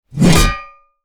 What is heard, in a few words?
hammer large whoosh impact nogore; soundmorph